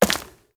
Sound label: Stone Walk; moul-assets